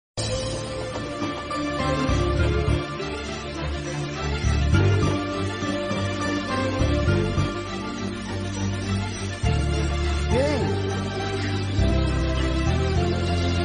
telugu bgm ringtone; varsham; prabhas

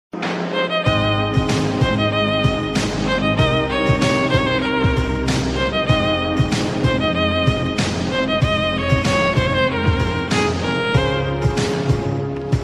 romantic violin ringtone

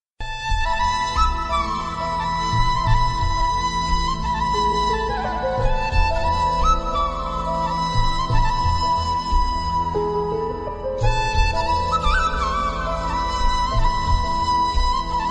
movie bgm ringtone; bombay movie

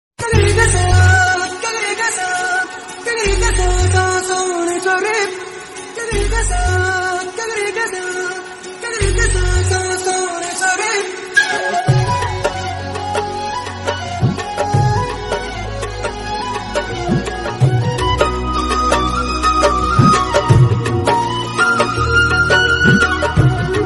movie love bgm love bgm tamil ringtone; anjaan; suriya samantha

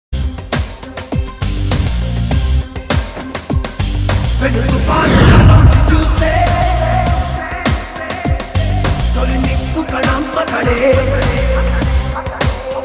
bgm; athadu movie

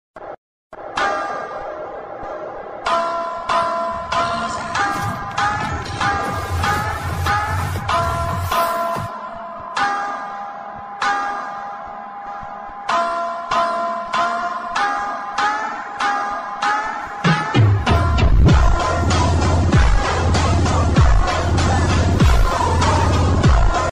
attitude english ringtone; sigma male ringtone; bad boy ringtone

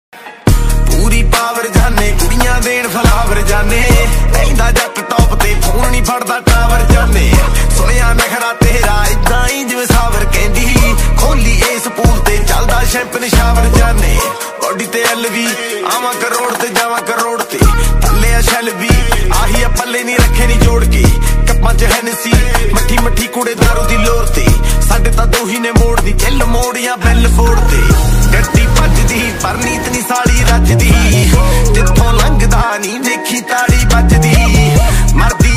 Punjabi ringtone

